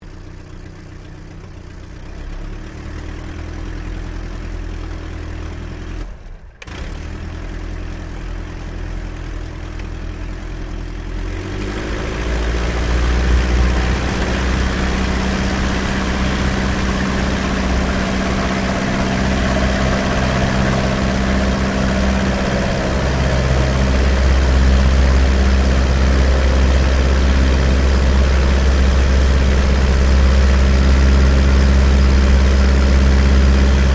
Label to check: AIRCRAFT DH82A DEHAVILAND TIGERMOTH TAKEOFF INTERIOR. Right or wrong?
Right